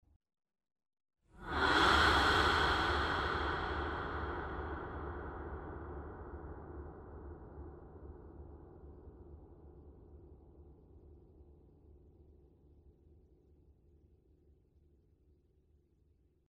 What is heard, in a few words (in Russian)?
prizrak bystro pojavilsja i ischez